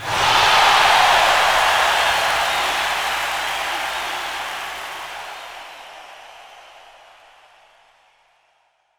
Crowd Effects; Vengeance - Essential Clubsounds Vol.4; 140BPM

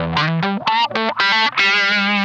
OVERLICK LO, Funk Guitar; 90 s Sample CDs; Zero G

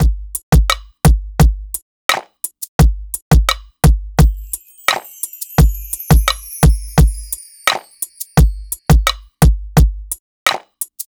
Drums, Cinematic Funk

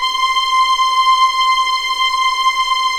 C6 EXP MF, VIOLINS; East West; The Ultimate String Collection

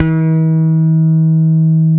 PRECISION BS F 4, Bass; Spectrasonics; Bass Legends

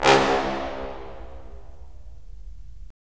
BRS, Roland LA Composer

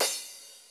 cymbal, Roland MC-909

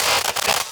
Glitches; Antidote Advent

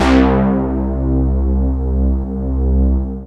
HUMUNGUS, OBERHEIM; OLD SYNTHS; Rubber Chicken Software Sample Bank

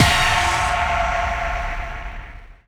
D+B

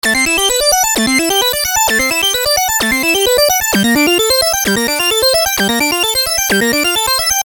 Arpeggio 130 A, Techno Synth Loops; Sonic Foundry (Sony Creative Software); Signals I

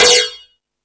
Crash, Drum Machines E-MU Pro-Cussion

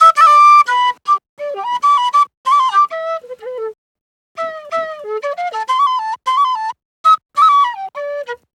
Penny 98 bpm G, Penny Whistle, African; Quazibeat; African Secret

